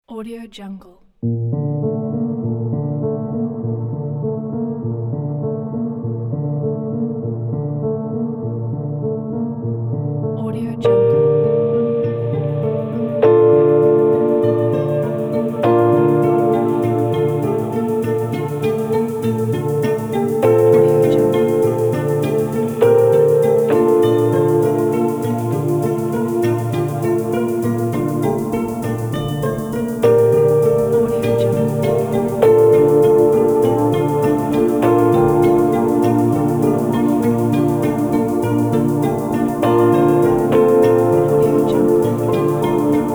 minimal world

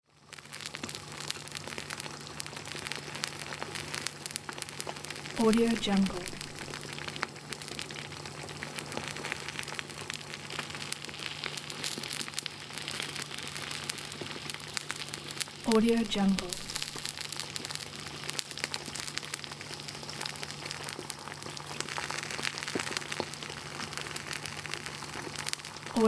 omelette frying

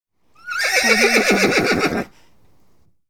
horse neighing

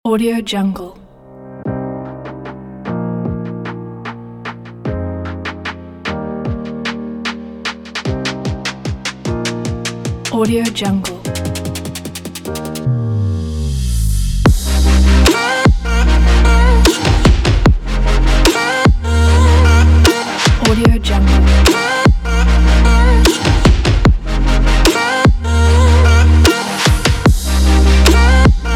uplifting future bass

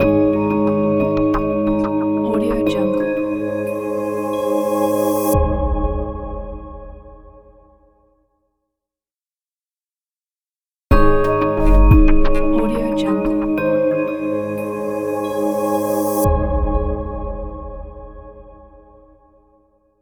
corporate logo

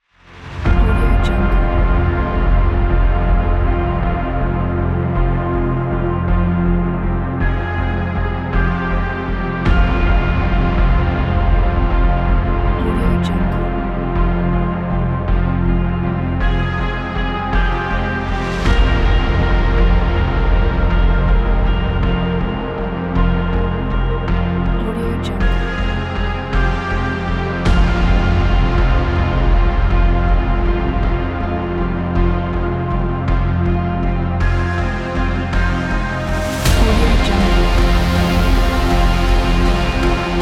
aggressive hybrid blockbuster

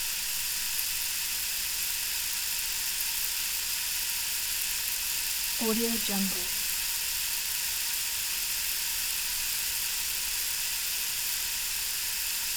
unreal rain hiss

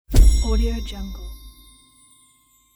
whoosh star

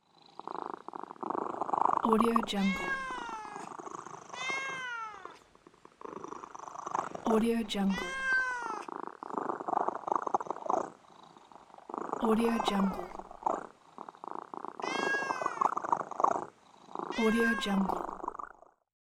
cat

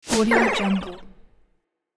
throw game accent

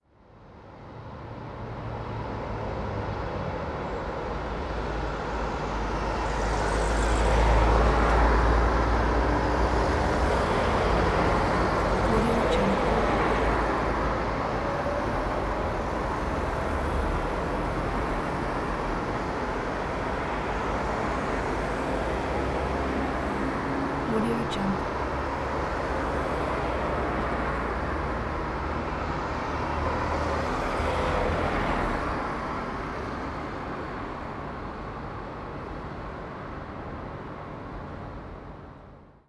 slow city traffic ambience

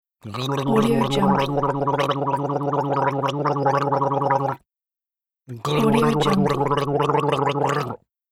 gargle

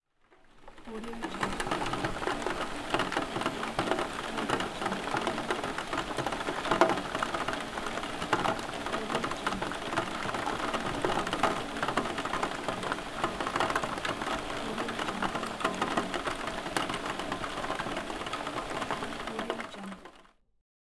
rain at roof window

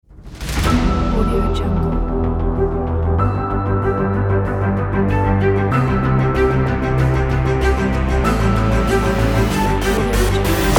sports action kit

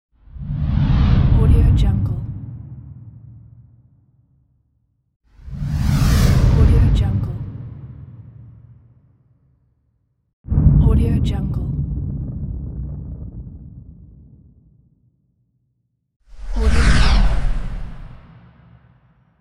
cinematic transition whoosh

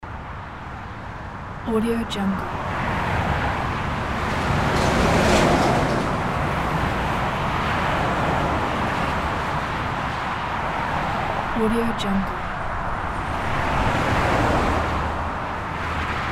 highway ambience

